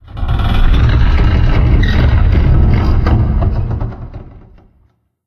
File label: Metal Stress; silent hill homecoming 2008 gamerip pc ps3 x360